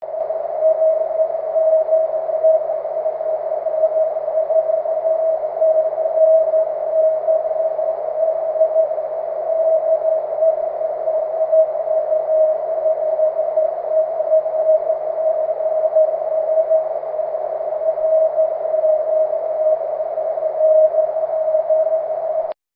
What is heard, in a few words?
cw; 23cm